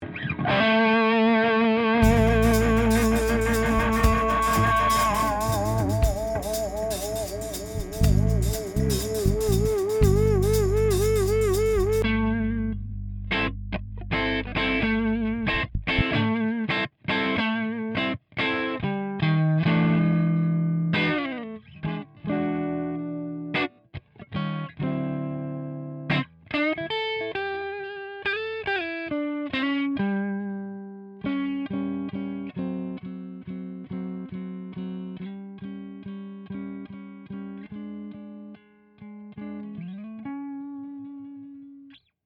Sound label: jingle blues